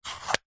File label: container plastic display case small lid slide close